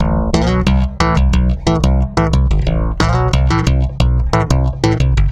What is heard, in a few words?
Stick Loop, Stick Bass